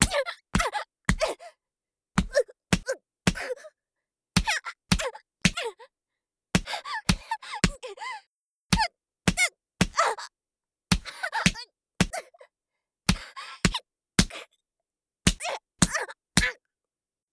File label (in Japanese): ジャブ BPM110 ワンツースリー; 効果音あり